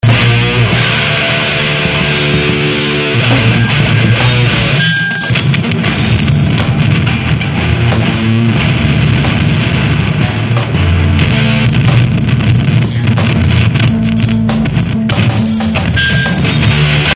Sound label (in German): saitenriss